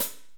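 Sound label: cymbal foot, orchestra percussion; Giga Samples Collection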